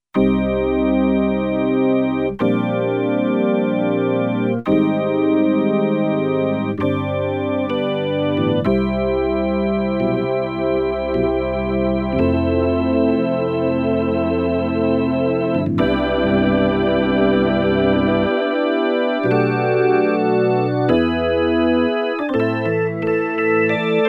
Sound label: Drwbr Orgn